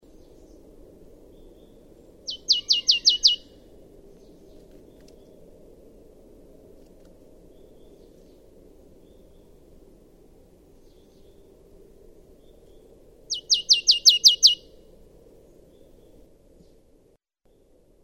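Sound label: Parus palustris, Marjamaa; Laulu / Song 28.3.2005 Märjamaa, Rapla maakond, Viro / Estonia; Linnut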